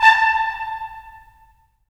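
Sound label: brass trumpets staccato, left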